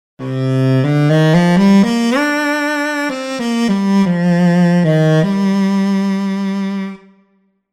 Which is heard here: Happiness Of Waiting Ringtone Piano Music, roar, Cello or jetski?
Cello